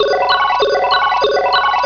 time up round

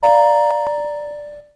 white buzz; japan

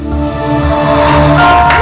open small; philippines

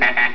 time up; usa

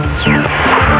swoosh

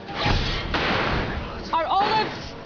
bonus open

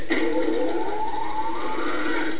spin

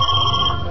ring in; philippines

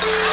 dong; france

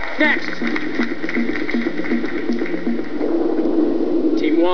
sel team; usa

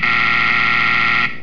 no win

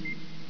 clock

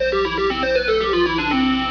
reveal

